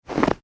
human footstep snow; Single Footstep On Snow; Single Footstep On Snow 3; McKinney Sound

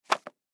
Magazine Drop, Magazine Drop Wood; Magazine Single Drop Magazine; Drops; Foley; Blastwave

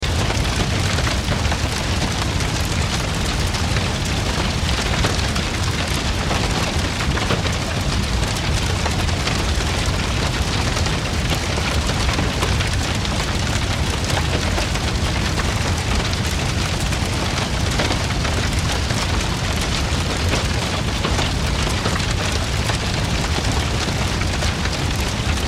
Fire Building Exterior Wood Flames Large Loop; Flame Sound Effects

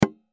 Hand Drum Hit Light Single; Musical; Drums; Blastwave

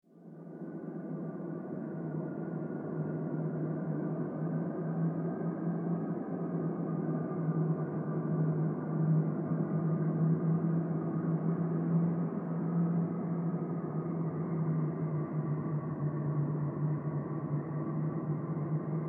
Horror Strange Wind; Scary Soundscape Sound Effects; Scary Soundscapes (Sound Effects:Horror)

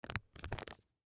Zip Lock Bag Movement; Plastic; Materials; Blastwave; Household

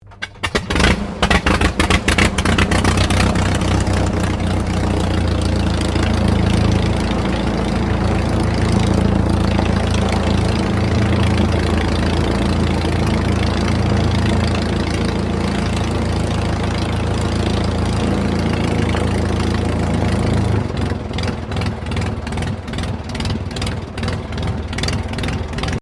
AIRCRAFT DH82A DEHAVILAND TIGERMOTH STARTUP IDLE; RAAF Tigermoth; Airplanes (Sound Effects:Vehicles)

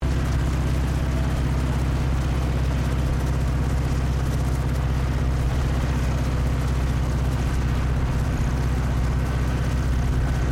HELICOPTER ROBINSON R22 HIGH IDLE LOOP; Helicopters; Sound Effects:Vehicles

